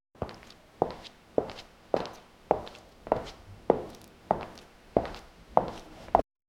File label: the sound of walking in, clack